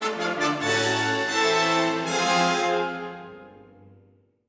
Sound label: victory, music